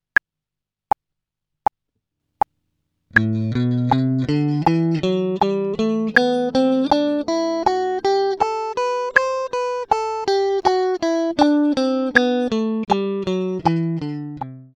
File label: 80bpm 8th note